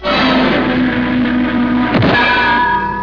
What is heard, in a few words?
anvil drop short